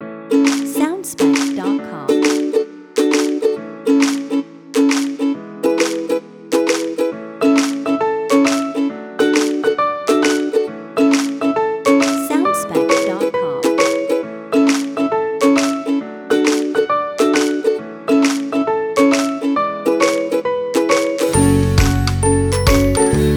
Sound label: Happy Ukulele Short Version